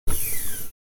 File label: Hydraulic Office Chair Moving